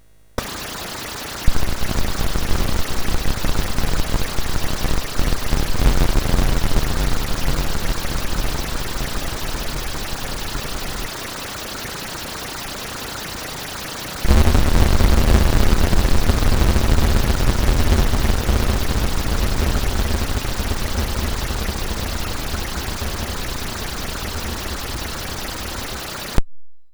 Storm, MOS6581R2

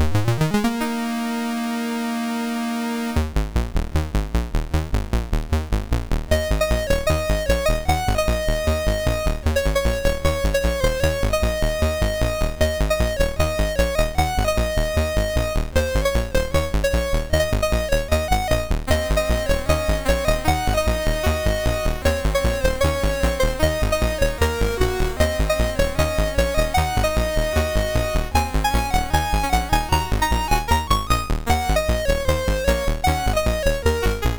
MOS6581R2, sid; Pocket Rockets

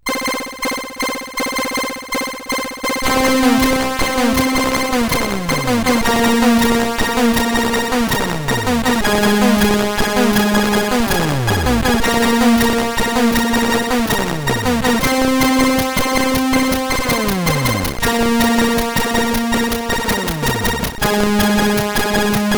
Amiga, CSG8580R5

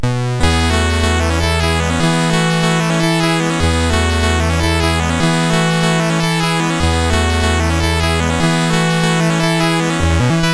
MOS6581; MOS6581R2; Fire Eater

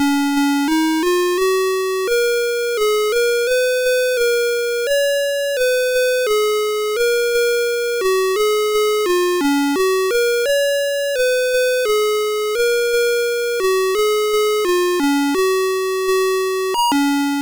sid MOS6581R4

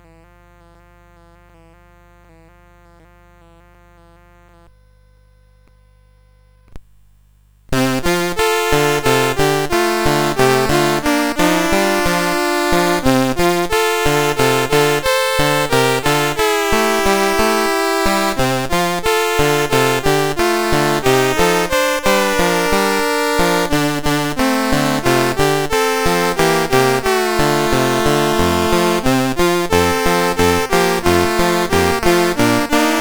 Red River Valley CSG8580R5

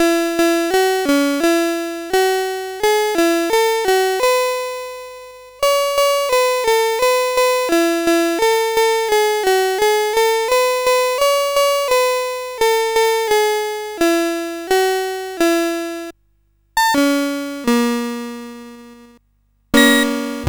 sid CSG8580R5

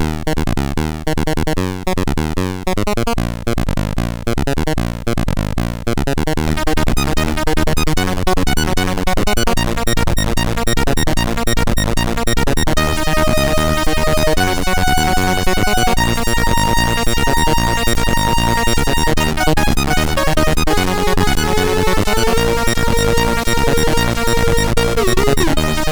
New Wave Jazz, MOS6581; MOS6581R3

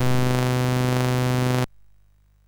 sid MOS6581R3; Gumshoe

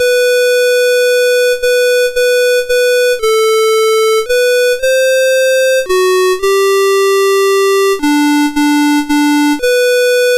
Come Holy Spirit Heavenly Dove MOS6581R2

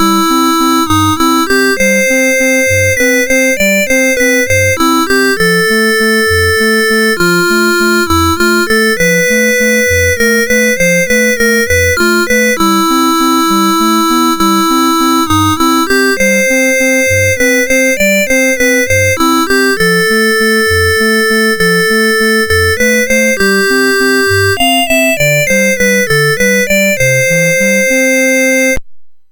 Calliope, MOS6581R4

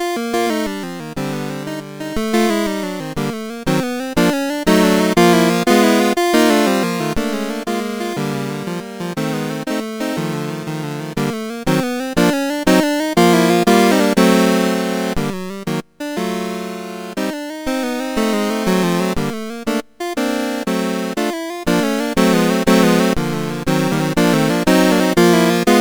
Tarantella, MOS6581R2

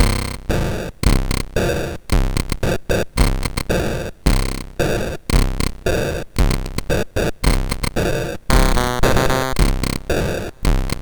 Dans Rap, MOS6581R2

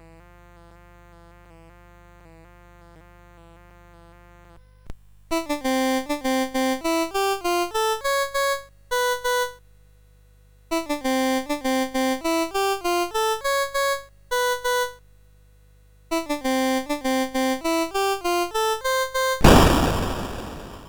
Little Joke, CSG8580R5

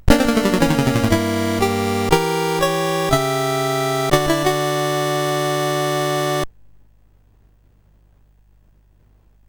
sid CSG8580R5; Gyruss

